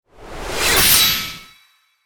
高速斬撃

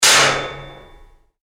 金属叩き

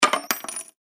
お賽銭

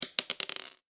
小さいシングルダイス

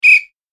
笛

